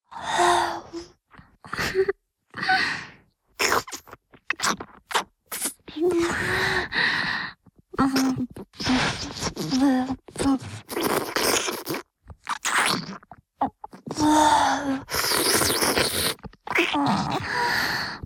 フェラチオ(中):陰茎を口内に含んだまま舌をうごめかせる, チュパ音; チュパ音抜粋集

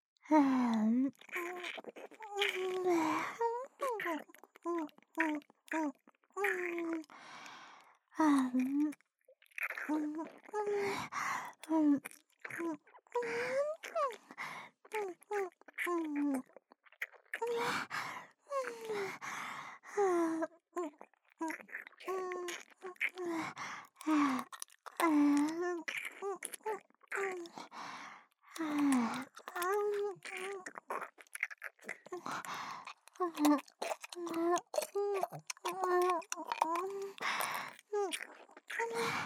フェラ

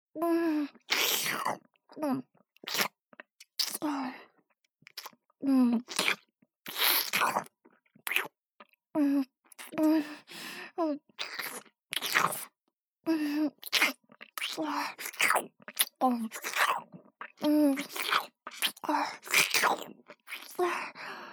ノーマル フェラチオ ループ

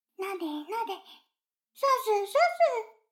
シコシコ音声; 逆時間停止されて

